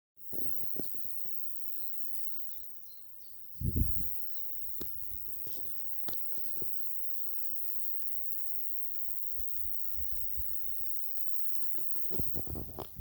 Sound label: roeselii